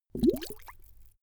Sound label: bubble short